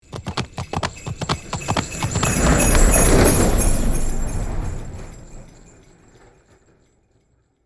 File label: Magical santa sleigh christmas passing by